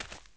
walk l, dirt foot; The Elder Scrolls III Morrowind GOTY; XBOX

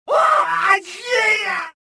choke

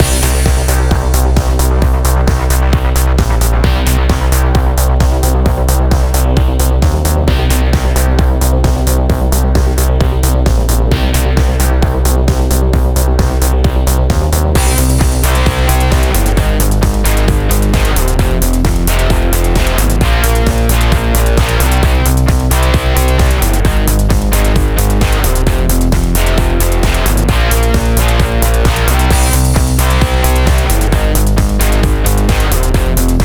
Music; XBOX; AMF Extreme Bowling 2006

